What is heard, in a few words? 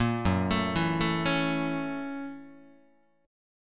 Gb 7th Sharp 9th Gb Guitar; arpeggiated; Gb7#9; 1,2,0,2,1,0; JGuitar